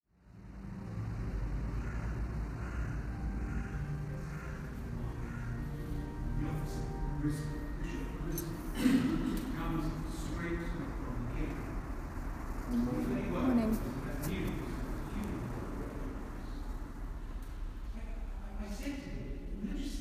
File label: Bristol Cathedral Soundscape; brigstow institute